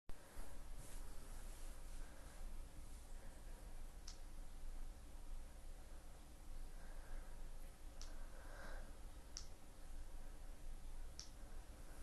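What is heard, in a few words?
[F4M] [ramblefap][toy noises][nervous][quiet][whimpering]; First time in a long time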